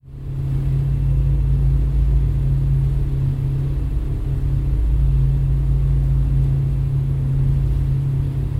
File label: Engine; JetTank